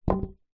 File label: foley plastic thin square panel plate down on carpet; zapsplat